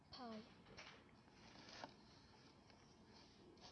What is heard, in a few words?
pipe